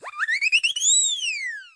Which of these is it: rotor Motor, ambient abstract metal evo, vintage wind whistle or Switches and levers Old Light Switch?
vintage wind whistle